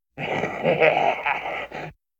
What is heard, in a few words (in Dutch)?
Lach Van Een Demente Man, horror effects; KMP Film Video Sound Effects